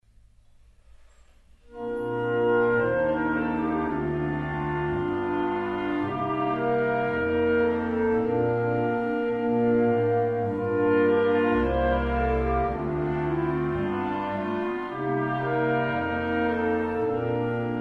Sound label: Ding Dong Merrily on High, christmas jazz